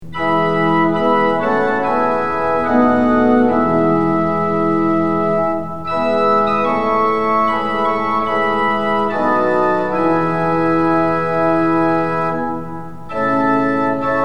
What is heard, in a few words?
tune; hymns; lillenas